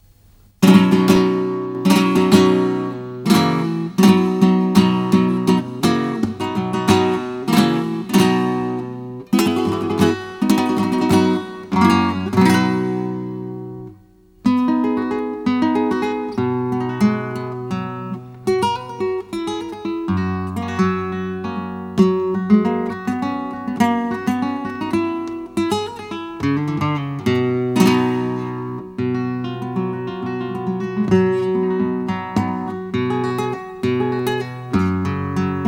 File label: Barrio Latino rumba